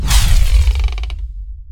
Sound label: staff door, magic